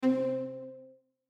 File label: accept mission click; disinformation-quest; new intro screen and sounds